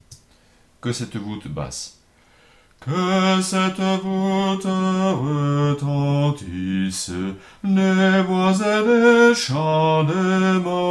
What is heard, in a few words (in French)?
basse; Que cette voute